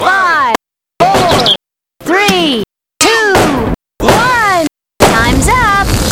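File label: count down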